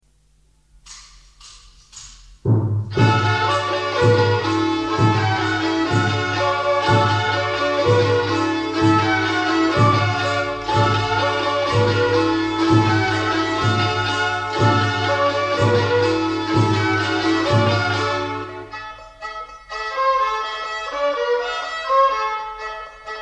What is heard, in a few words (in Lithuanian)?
Polka, kapela